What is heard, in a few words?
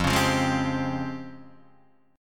Db Major Sharp 11th F